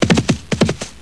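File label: gallop loop, horse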